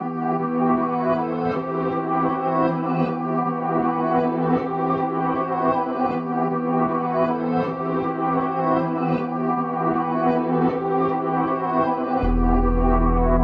157 BPM E Min, Reverse; Purple and Gold

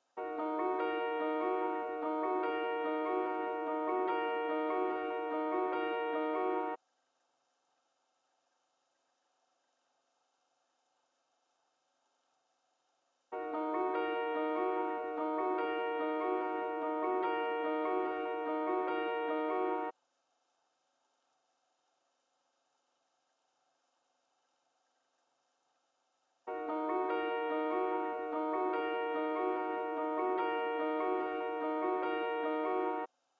146 BPM D# Min Piano; Trap Melody Loops; Loop Stems; Cymatics; Powers

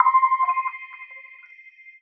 KEYS (C), Pedal One Shots; Line; Cymatics; ANALOG EVOLUTION Beta

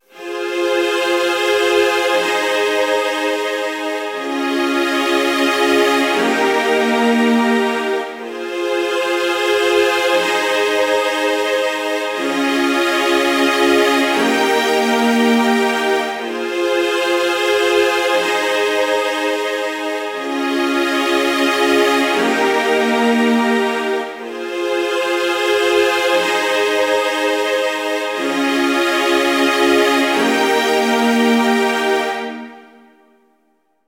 String Section Harmony - Fm 120Bpm; Construction Kits; STEMS; Ghosthack; SkyRealm; Kit 25 - Vvardenfell

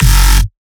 Bass Shot F#; Trap and Dubstep; Bass One Shots; Mastodon; Ghosthack; Free Trap and Dubstep Samples 2018

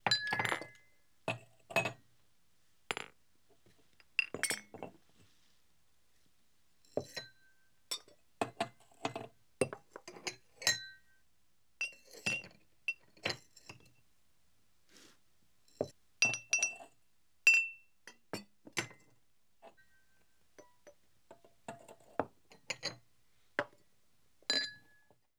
Glass Clinks And Motion; Home & Office

